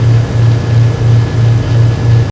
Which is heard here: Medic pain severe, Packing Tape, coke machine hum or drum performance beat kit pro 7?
coke machine hum